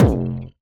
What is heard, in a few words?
Kick Charred